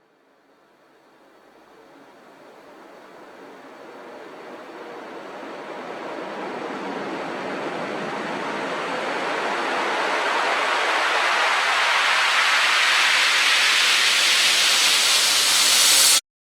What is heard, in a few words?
Uplifter